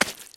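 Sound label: stepping into mud with a foot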